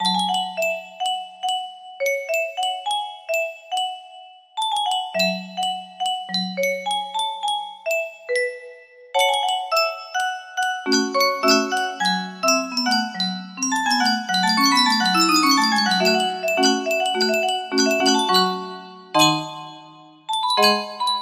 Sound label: musicbox